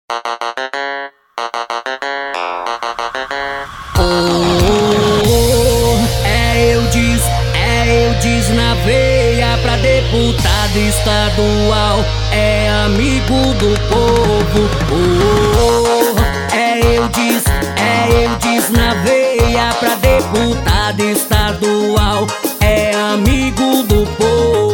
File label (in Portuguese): jingle; eldes na veia